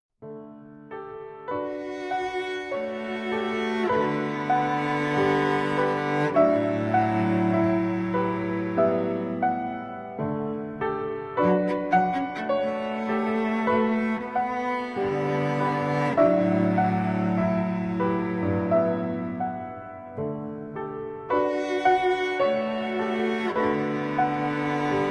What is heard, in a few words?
duet cello piano